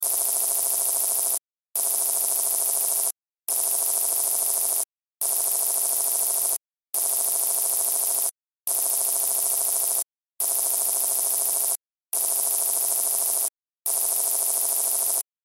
Sci Fi Computer Screen Readout Digital Display Loop